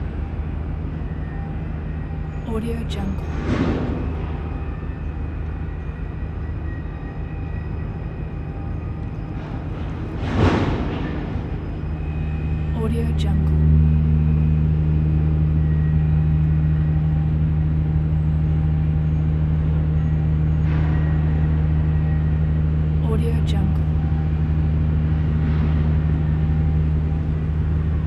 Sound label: container harbor busy ambience